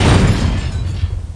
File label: Large Ground Explosion; Defiance